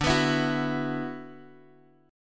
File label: D 6th Add 9th D, Guitar, Drop D